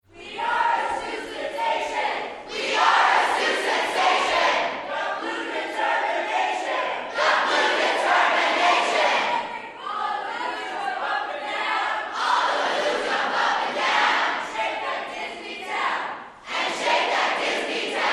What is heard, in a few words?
Blue Cheer; color war